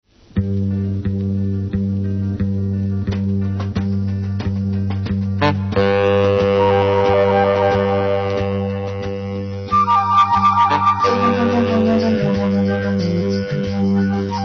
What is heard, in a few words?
flute